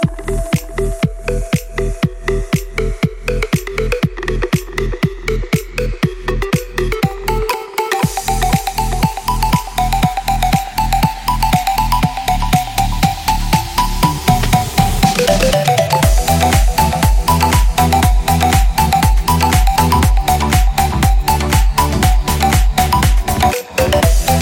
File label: Electronic Version